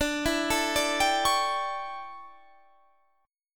D Major 7th Suspended 2nd Suspended 4th D down, chord; DM7sus2sus4 arpeggiated